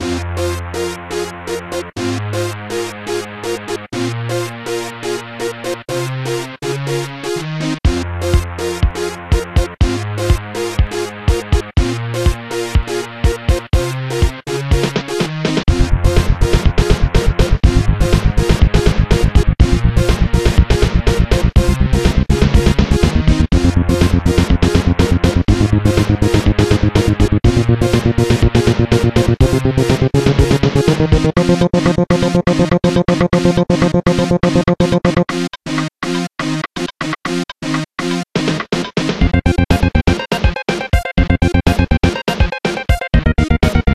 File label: rave dancetune; nes; cool spot